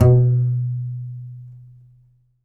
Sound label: C. Bass Balalaika, Plucked Strings; E-MU Producer Series Vol. 7 – Old World Instruments